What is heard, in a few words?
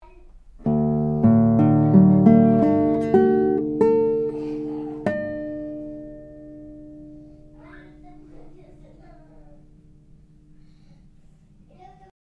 wall behind